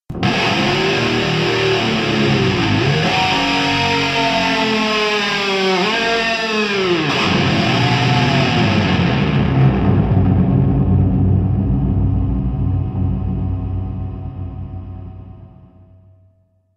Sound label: lick; brutal legend